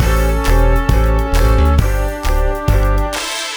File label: CIRCUS